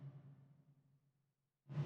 480 Rev

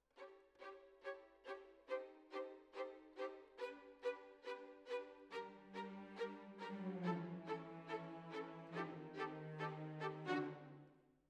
Violins, jazz for piano

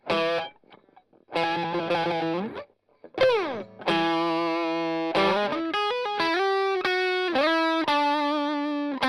Strum Line

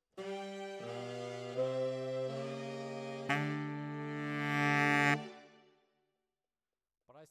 Bari, RNR for piano

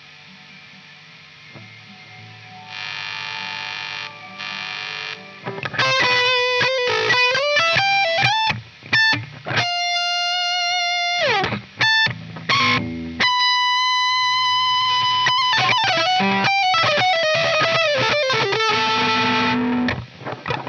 Chorus Gtr

